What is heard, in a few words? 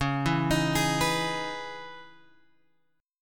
C sharp Minor 9th C sharp, chord; C#m9 Chord {9 7 X 8 9 7} arpeggiated